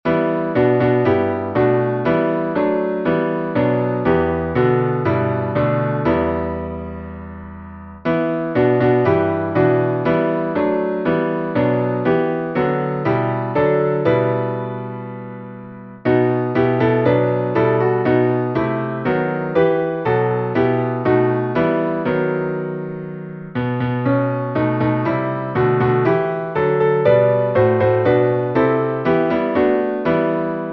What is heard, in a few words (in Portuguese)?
salmo instrumental